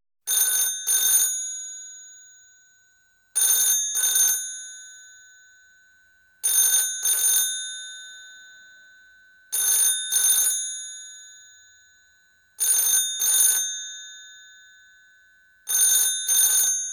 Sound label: Telephones; Industry & Commerce